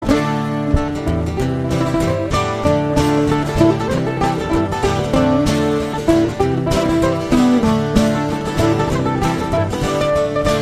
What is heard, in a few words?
Long note